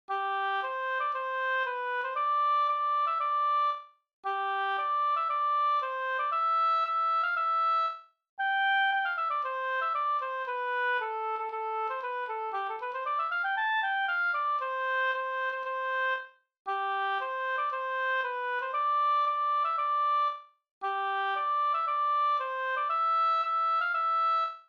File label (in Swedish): ganglat; Gånglåt efter August Strömberg, Jät, Småland